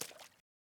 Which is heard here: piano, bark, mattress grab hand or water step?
water step